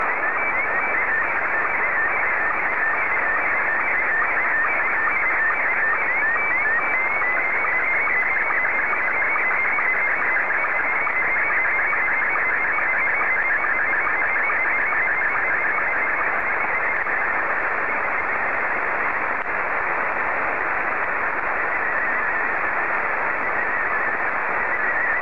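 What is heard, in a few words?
SSTV, Scottie 2; VK4MSL SSTV RX @ QG62ln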